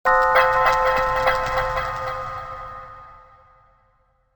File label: Decisive moment or bright idea wipe/stinger; Sound Design